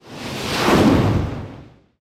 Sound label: f14 jet whoosh; sound ideas